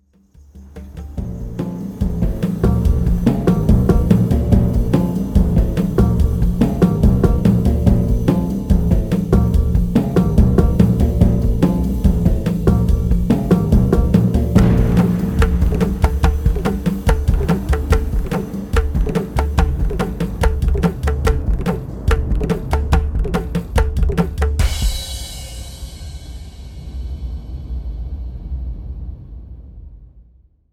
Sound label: Percussion